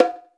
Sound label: BONGO L; RX5; Crypton - DrumBase5000